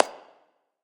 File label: clap; smite; god thanatos